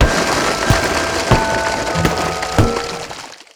taunt; dota; slardar

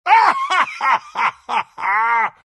bearform laugh; dota; lone druid

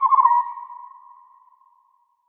waterfowl; ambient soundscapes; dota

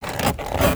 creak, movement; snapfire

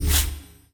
drawer slide out; ui; custom lobby

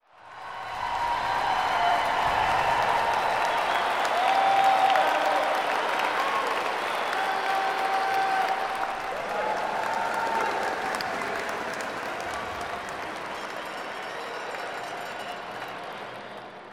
crowd med, spectator

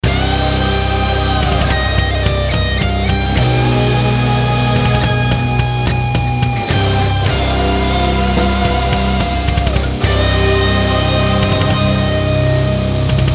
lose; stingers

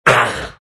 big pain; Undying

